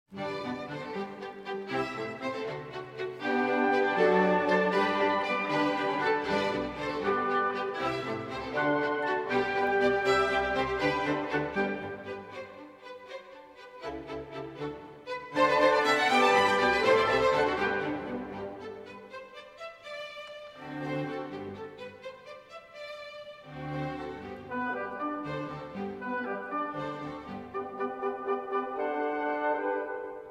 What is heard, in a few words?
Violin Concerto in G Major Rondeau Allegro; Violin Concerto No. 3 in G Major, K. 216; III. Rondeau Allegro